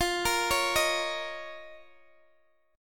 F 7th Suspended 4th Sharp 5th, Guitar DADGAD; x,x,15,15,16,13

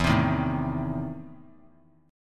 E Major 7th Suspended 4th Sharp 5th; EM7sus4#5 strummed

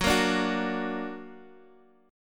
D Augmented, chord

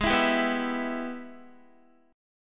Ab Minor Major 7th Sharp 5th, down, Guitar; strummed; JGuitar

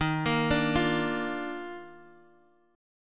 D sharp Major 7th Suspended 2nd, Guitar, Open G; x,x,1,3,3,3; chordshape

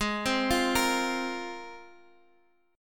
Ab 6th Add 9th down Guitar Open F; Ab6add9 arpeggiated; Ab6add9 Chord

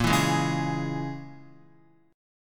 Bb Minor 7th Flat 5th, Guitar; Bbm7b5 strummed

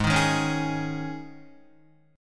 G sharp Suspended 4th G sharp, Guitar; 3,6,3,6,3,4; G#sus4 strummed

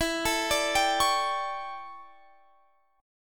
D Major 7th Suspended 2nd Suspended 4th, chord

